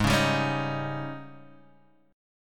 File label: Ab Major 7th Suspended 4th Ab down; strummed; JGuitar